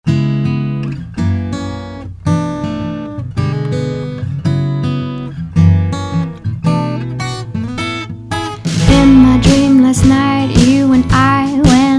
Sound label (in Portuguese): trilha emocional; vivo